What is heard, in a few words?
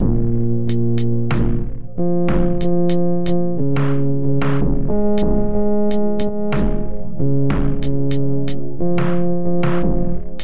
loop